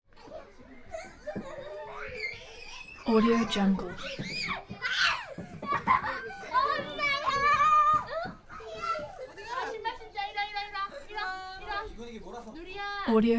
korean childrens game play chase each other; prosoundfx

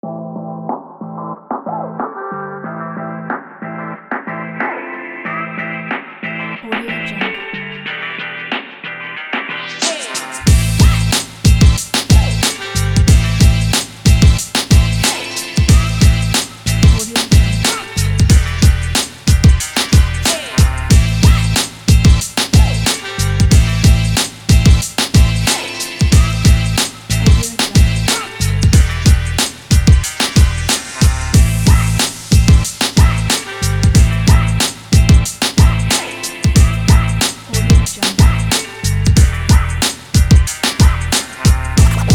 hiphop

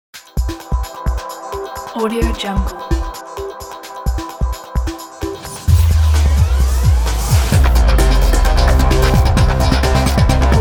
hitech energy; cleanmindsounds

